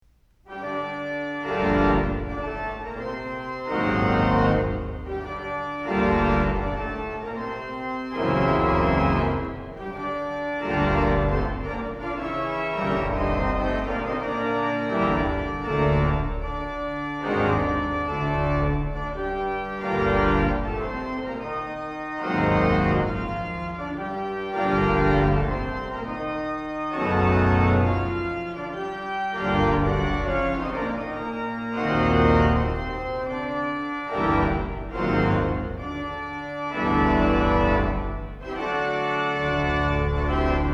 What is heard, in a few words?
Noel varie Grand Choeur Molto Maestoso Conforza E Vigore, organ; Chartres